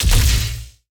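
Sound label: Sci weapon Barrier Blast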